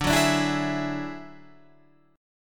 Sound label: F sharp 7th Suspended 2nd Sharp 5th, D